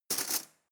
cutlery teaspoon drawer grab hard

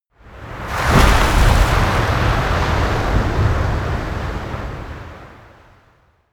coast oneshot surf strong

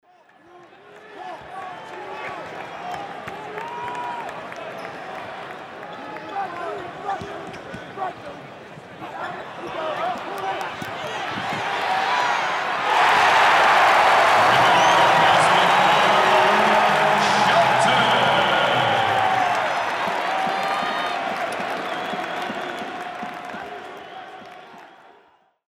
cheers applause

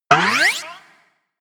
spring

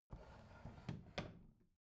bathroom drawer close rattle

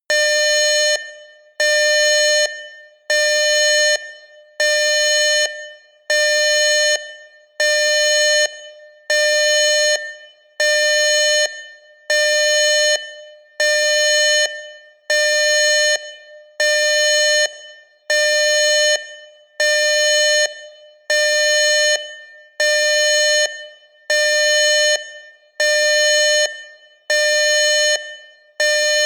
notification warning

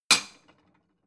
plate small shelve hard

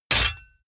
sword hit metal

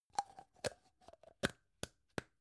gum plastic box close long

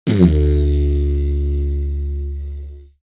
didgeridoo short heavy

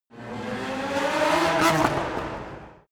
racing car f1 drive by short

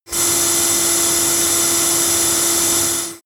vacuum close bright hiss

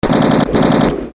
machine gun

